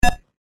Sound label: Push The Beep Button; Beep Sound Effects; electronic sound effect